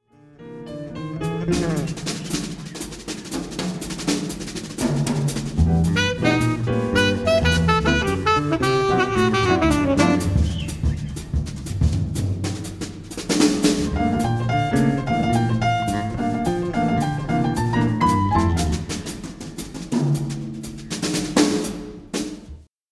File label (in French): extrait swing